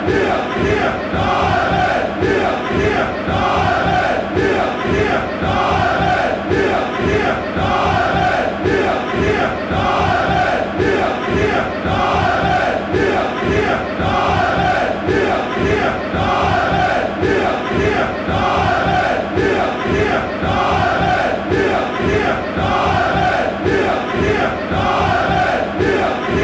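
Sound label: b moenchengladbach chant, football songs and soccer chants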